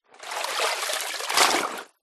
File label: leisure lilo flat uninflated lift out of water and back in